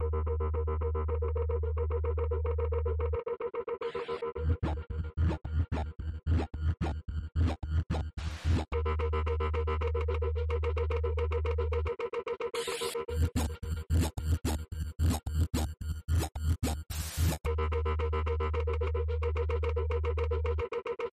House Synth 110bpm

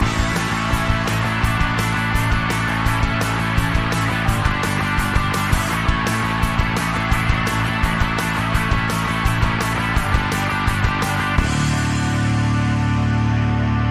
music Stinger; Give Me More

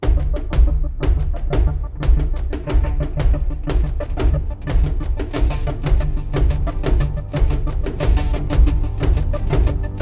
Alt Percussion

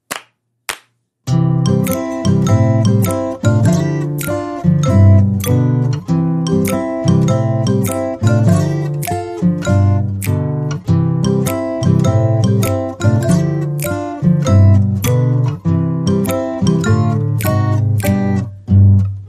shallow happy no whistles

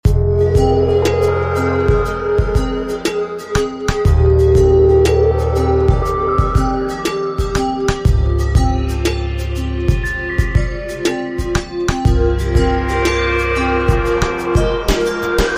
corporate presentation

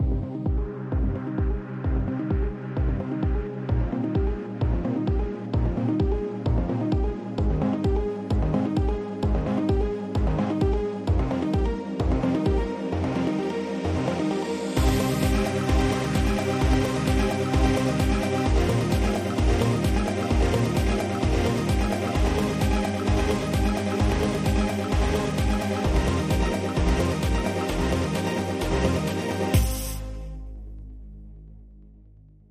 130bpm Alt Percussion

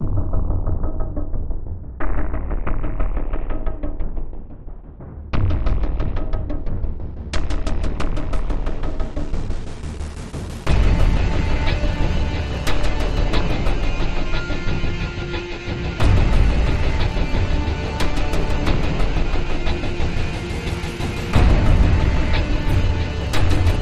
Alt Percussion

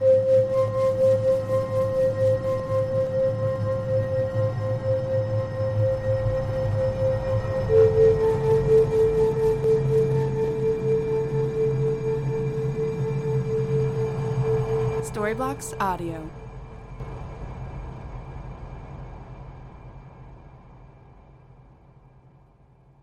Ambient House Ambience 125BPM C

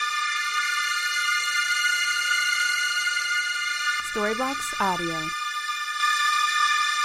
Ambient House Pad 136 BPM C

